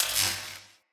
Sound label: Shock Projectile Zap